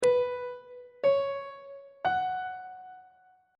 b suspended 2nd triad chord intervals on treble clef